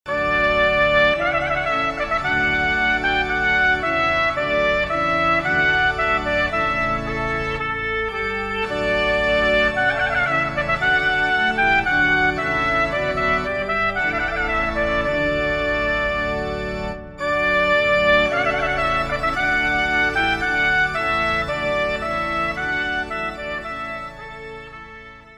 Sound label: Trumpet Voluntary